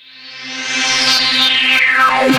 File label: Horn Sect Effect; Sonic Foundry (Sony Creative Software); Jazz Solos and Sections; Effected